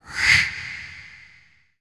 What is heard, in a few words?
reverse surreal breaths; Sound Design - Horror; Sony Pictures Sound Effects Series Volumes 1-10; Sony Creative Software